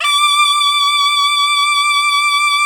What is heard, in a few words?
MIZMAR; World Combo